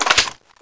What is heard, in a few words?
mp5 slide back, weapons; csof